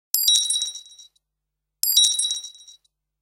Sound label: Coin